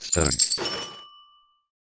Sound Powerup Stonks Trigger

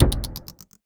Sound Slot Machine Again Score

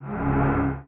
Sound Special Pattern Dark

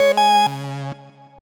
ATM Fanfare

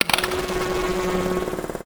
Ticket Machine Running

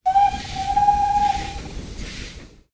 Toy Train

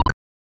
Slot Menu Select

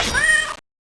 Sound Powerup Lucky Cat Trigger

